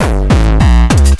4 gabba kicks close

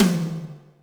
Yamaha RM 50 Toms

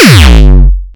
Deep Kick, kicks

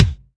Kick Dry

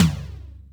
Yamaha RM 50 Toms

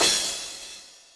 CYMBAL, Yamaha RM 50

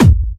Medium, kicks

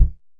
kicks, Bass Drum

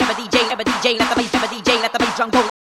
gabba dj, audio loops

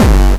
bombo, kicks, Hardcore

